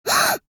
Young girl shock

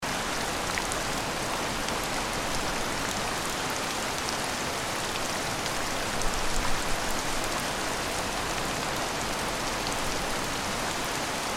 Heavy rainstorm ambience city loop

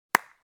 High five

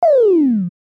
Line graph decrease animation infographics

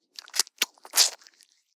Hair gel paste rubbing in hands

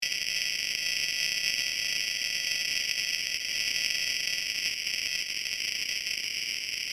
Radio static noise loopable

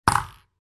Hitting the ball table tennis paddle

